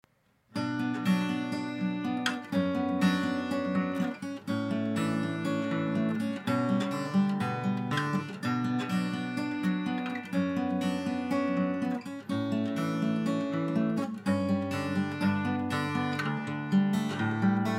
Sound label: fix acoustic gtr recording